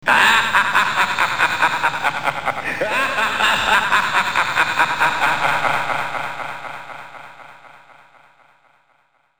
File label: laughs